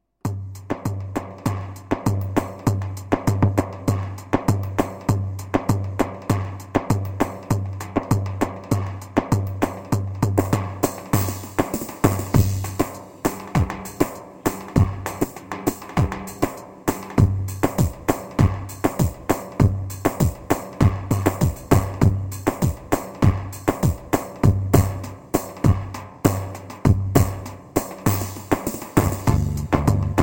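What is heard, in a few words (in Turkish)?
pa 500 tr tekno halay, korg; KARIŞIK RİTİMLER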